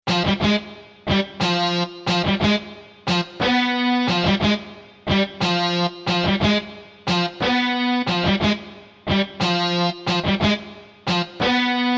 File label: guit